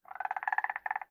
青蛙待机声

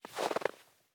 细雪踩踏声

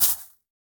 下界苗踩踏声

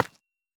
方解石放置声

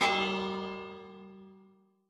钟使用声

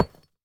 深板岩放置声

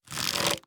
弩快速装填三阶声

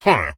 唤魔者待机声

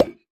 饰纹陶罐插入声

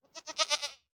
山羊待机声

